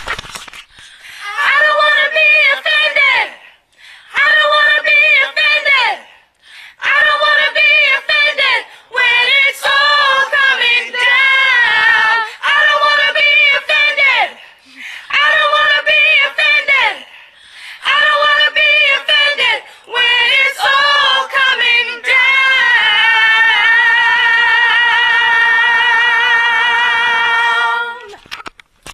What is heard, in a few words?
baptize soprano